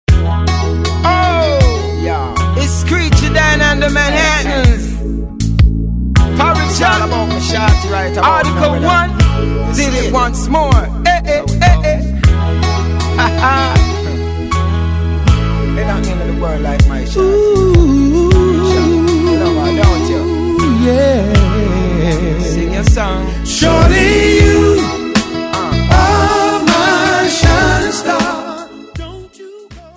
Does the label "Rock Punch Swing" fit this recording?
No